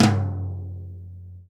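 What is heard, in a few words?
tom two tom, acoustic toms; Roland - Rhythm Section; Real Toms 1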